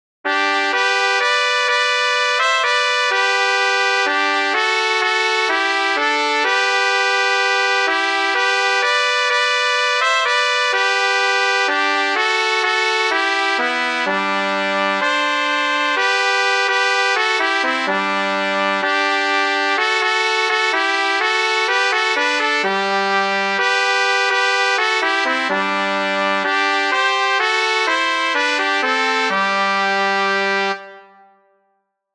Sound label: 2pt Trumpet